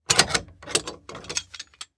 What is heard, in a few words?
Foley Padlock Open Fast